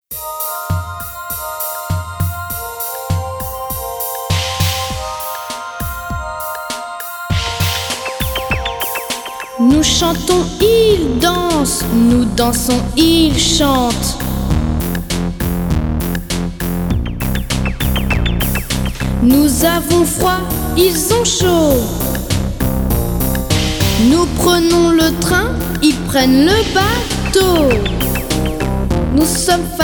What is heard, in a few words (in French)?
RAP